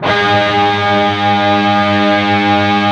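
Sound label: PRS power chord A 3 L, guitars; PWR CHRD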